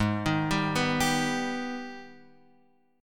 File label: G sharp Minor Major 7th